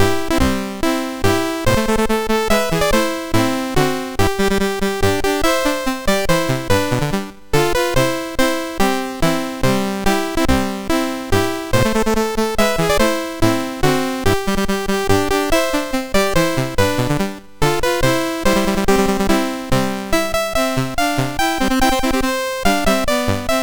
Anthem, MOS6581R2

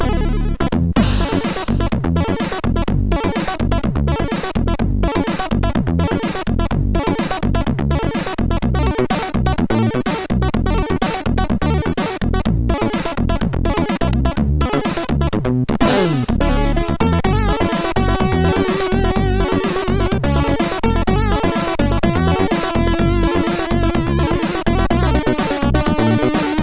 Tropical Funk, sid MOS6581R4; hvsc

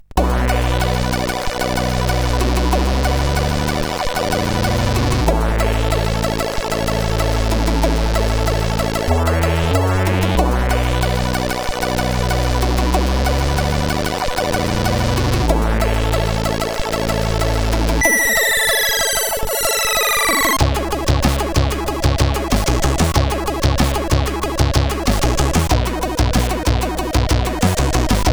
Hip Hop, CSG8580R5

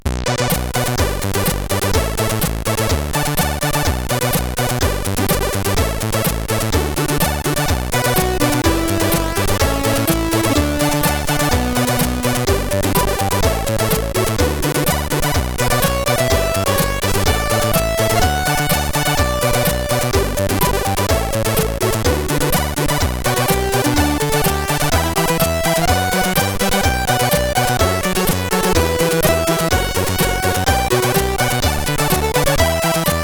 Can Only Do This Style, sid CSG8580R5

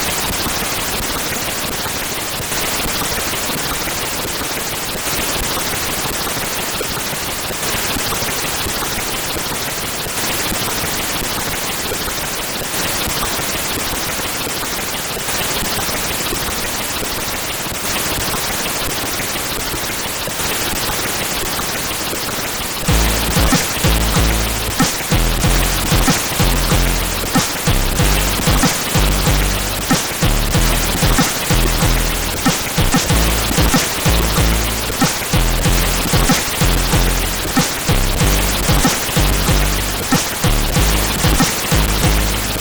intro, CSG8580R5; Kiwi Magic

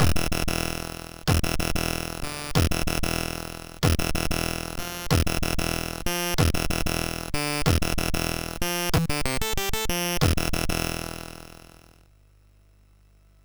MOS6581; MOS6581R3

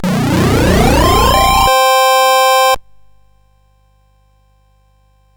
Dragonworld sid CSG8580R5

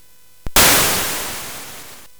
Space Gun

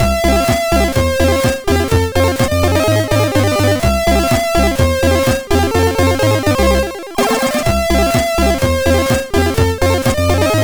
sid MOS6581R4; Bernies Lifestyle note

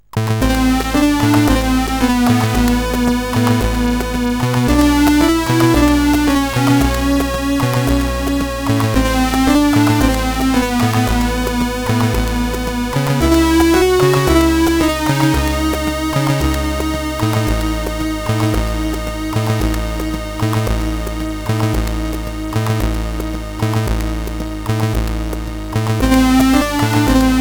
First Drum, CSG8580R5

